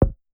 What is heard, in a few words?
Percussive Elements; 99Sounds; The Weird Side Samples